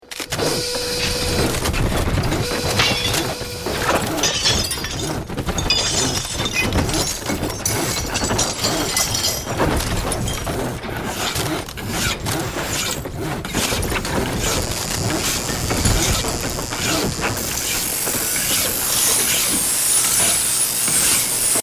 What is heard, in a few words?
set change